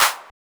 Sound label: Clap